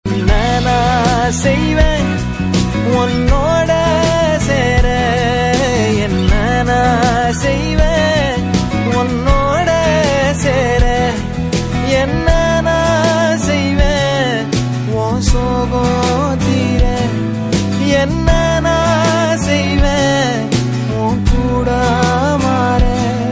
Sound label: Ringtone; Meyaadha Maan Movie; Tamil Ringtones